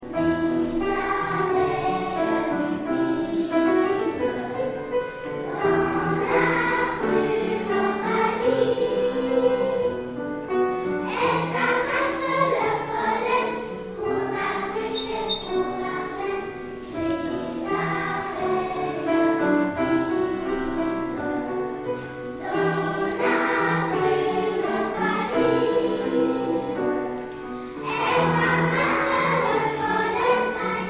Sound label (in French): Canon des abeilles